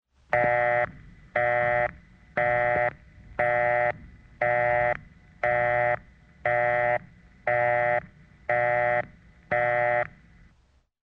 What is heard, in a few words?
Telephone Busy Signal; SOUND EFFECTS1